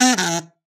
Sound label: kazoo short fall; soundscrate